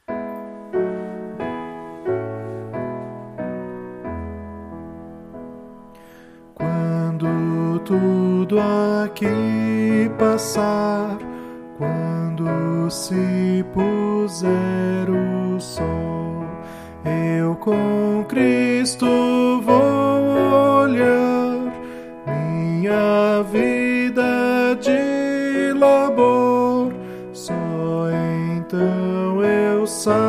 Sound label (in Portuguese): voz piano